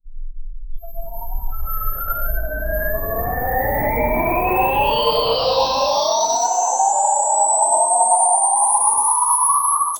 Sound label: accumulating sound effect, gain